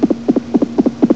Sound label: HEART; CMI Sound Library Rev 1.3